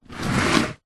luggage suitcase leather laying on side drag push hard ground short; foley; zapsplat